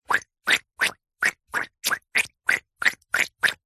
foley bicycle pump in shallow water several short fast air releases bubbles; zapsplat